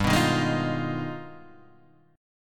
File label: G sharp Minor Major 13th G